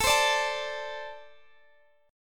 A Minor Double Flat 5th, down, Guitar, Open D

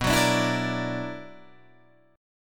B-Minor Major 11th B down Guitar Drop D

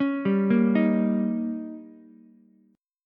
D sharp 7th D sharp, Ukulele; arpeggiated; JGuitar

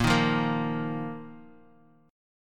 A sharp Suspended 4th; strummed; A#sus4; JGuitar

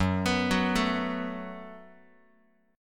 F sharp Minor Double Flat 5th, Guitar; arpeggiated

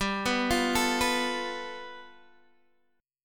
G 9th, Guitar Open D

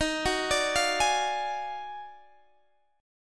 Eb Minor Major 11th; EbmM11; arpeggiated

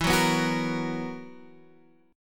B Major 7th Suspended 4th, guitar chord

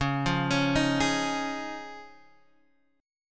D sharp 7th Suspended 2nd Suspended 4th C sharp

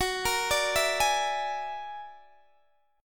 F sharp Augmented 9th, Guitar, Standard; F#+9 arpeggiated; x,21,20,19,17,16

